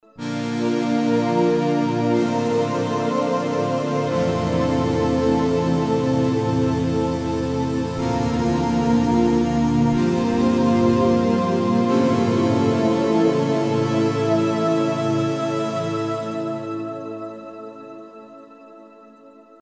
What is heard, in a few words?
pad digi, synth